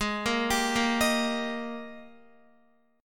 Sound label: G sharp Major 7th Suspended 2nd G sharp, down, Guitar; arpeggiated; chord